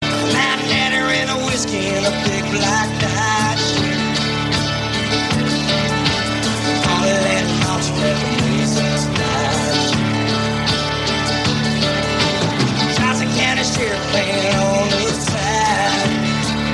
whisky glass musical tone ringtone

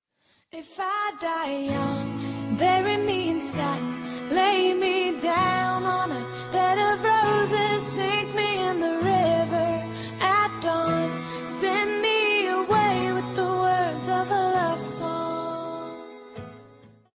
chorus ringtone

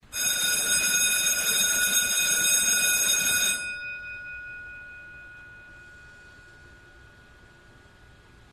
school bell ringtone

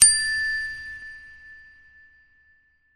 glass sms iPhone ringtone

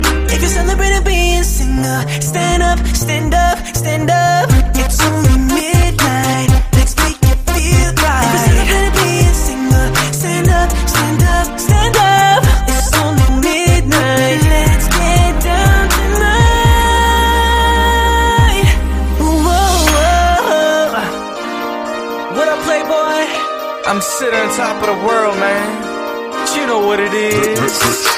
english song tone ringtone; english ringtones

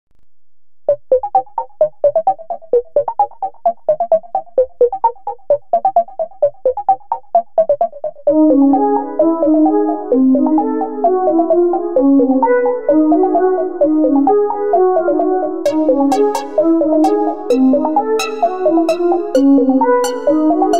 iphone ring ringtone; iphone 10

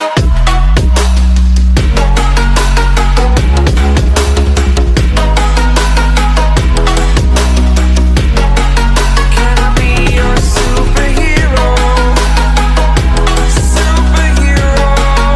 remix love music english ringtone

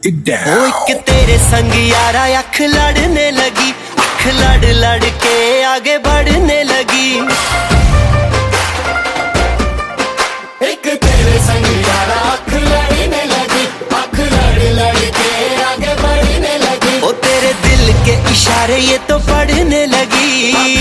ringtone, bollywood ringtones

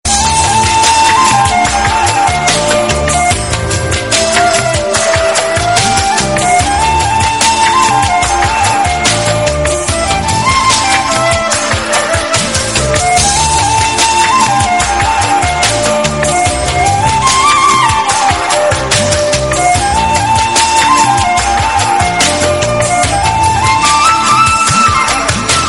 soft lovely background flute music tone ringtone; galaxy ringtones

